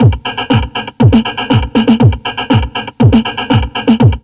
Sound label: Drum Loops Crazy Processed